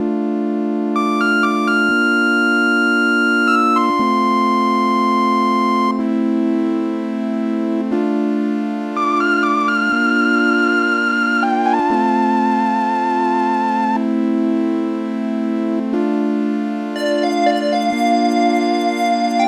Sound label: Synth Phrase